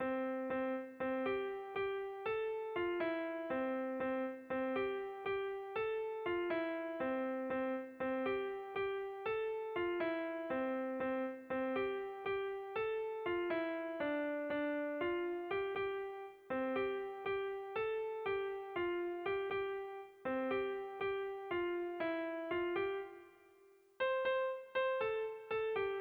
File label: melody